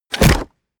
mechanical switch wood large push; industrie-wirtschaft; mechanik

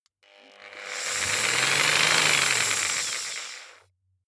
jigsaw engine slow short; maschinen; saege

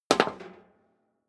step high heels metal rattle single stair down; schritte

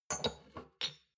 shot glasses stack slide soft; soundpool

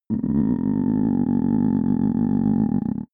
stomach growl; soundpool

